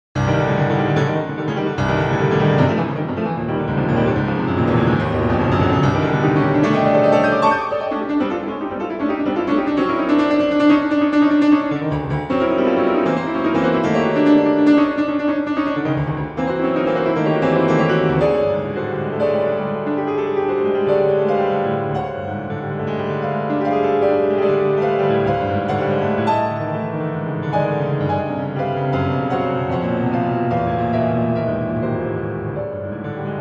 Late Piano Works

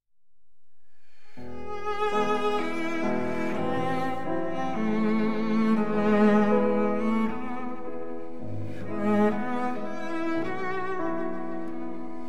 Piano Quartet in C Minor Andante Live

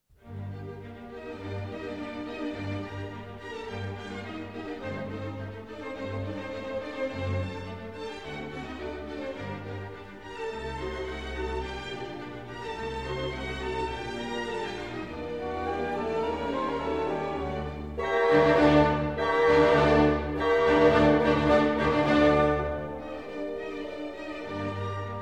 Symphony in G Minor, Allegro Molto

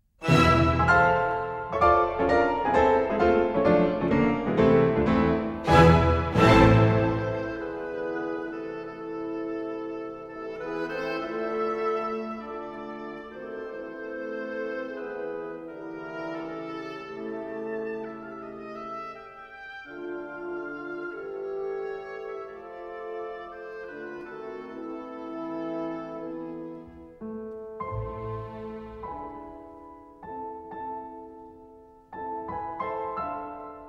Piano Concerto in A Minor; Op. 54; Schumann

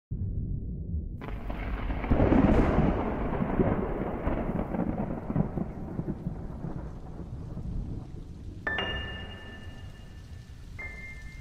sad piano

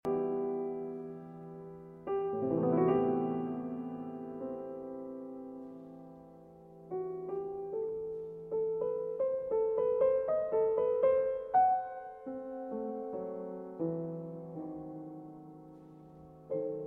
Partita in E Minor, Sarabande; BWV 830

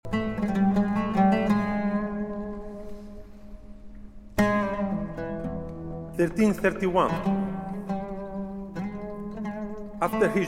Taqsim and Narration; Yurdal Tokcan

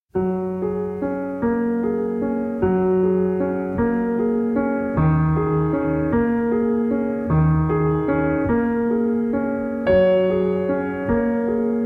Solo Piano

